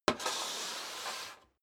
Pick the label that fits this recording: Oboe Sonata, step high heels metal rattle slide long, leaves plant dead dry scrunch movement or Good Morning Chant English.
step high heels metal rattle slide long